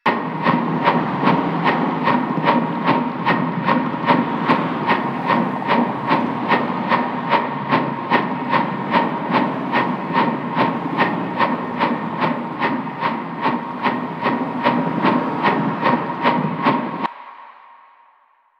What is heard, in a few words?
Cave Floatation Device Loop; Ambience; Warframe; Stalker Cave